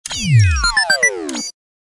deactivate; agent 8 ball

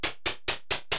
stairs up end; zsdx

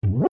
lift; zsdx

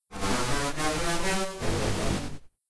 LVL DONE; commander genius

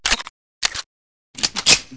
auto reload, weapon

